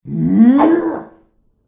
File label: human male diver attack voice bottom